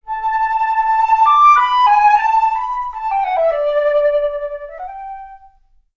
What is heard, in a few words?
Flute